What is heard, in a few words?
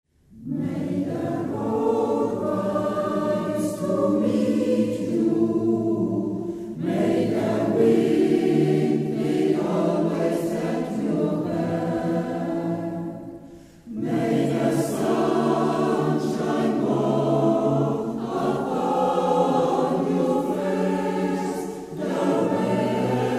An Irish blessing, Singkreis; Hohentanne Bischofszell